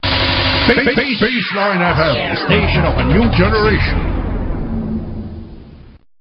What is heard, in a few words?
jingle